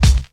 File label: studio kick drum C key